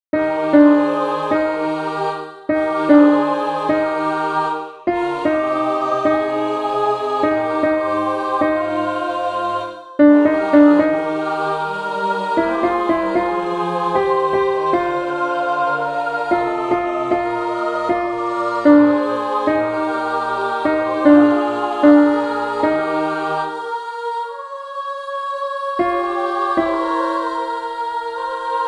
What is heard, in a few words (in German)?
Alt Klavier; As torrents in summer; Edward Elgar